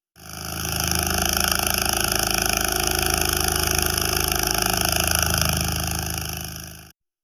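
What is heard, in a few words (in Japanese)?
kabutomushi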